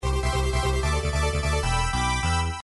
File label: race finish; gameboy advance; gt advance 2